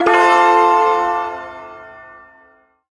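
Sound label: Shawzin Major Chord; Maj Chord G; Warframe; Duviri Ersatz Shawzin; Chords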